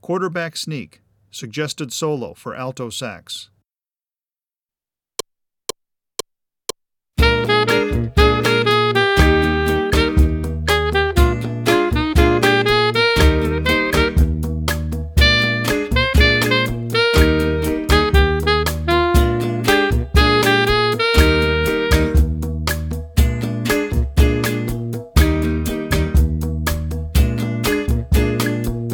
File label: suggested solo, jazz band